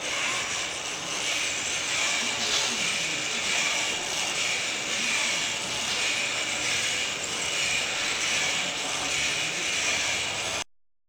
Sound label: front hight, worm